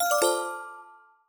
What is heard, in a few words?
lose, slot machine